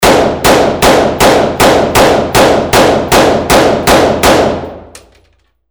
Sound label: ar15 rifle multiple shots